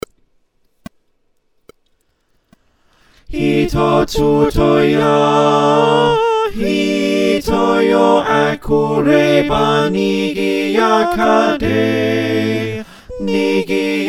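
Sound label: soprano alto tenor bass, choir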